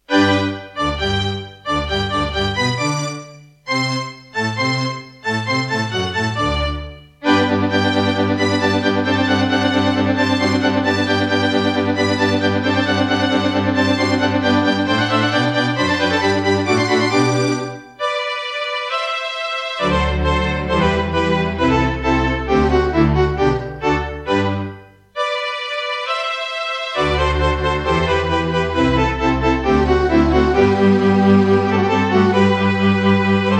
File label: Serdaco E-Wave; Mozart - Eine Kleine Nachtmusik partial; classical